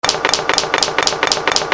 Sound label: OFFICE